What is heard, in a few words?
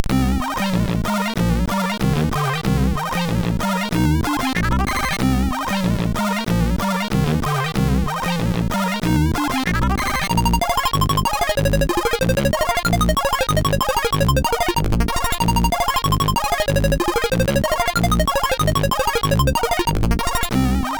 atmosphere; SIDTunes